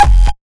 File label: kikk, kick